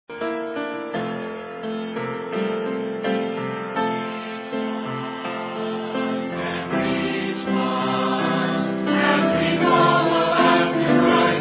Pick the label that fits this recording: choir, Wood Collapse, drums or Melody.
choir